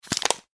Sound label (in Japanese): ペットボトルをつぶす